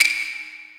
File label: normal hit whistle; McOsu